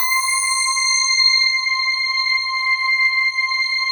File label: PAD, ATMO PADS; Best Service; XX Large